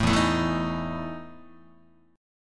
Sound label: E Major 7th Suspended 4th E down Guitar; strummed; 4A,4E,5A,5D,5G#,6C#; JGuitar